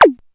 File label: zoop, Electronic Hits